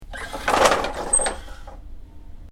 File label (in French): boite